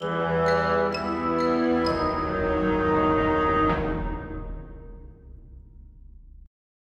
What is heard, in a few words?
Stinger; Boom Inventor Extraordinaire